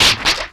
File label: snap, percussive